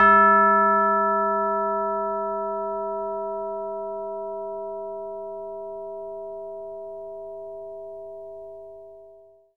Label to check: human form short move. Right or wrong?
Wrong